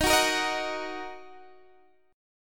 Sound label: Eb Minor, Guitar, Open D